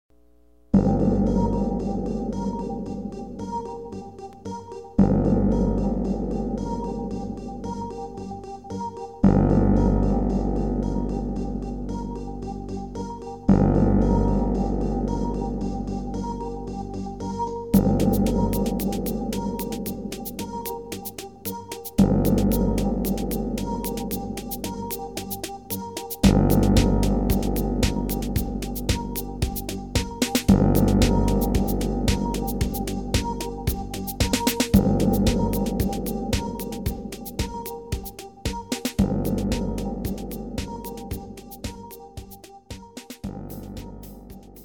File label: music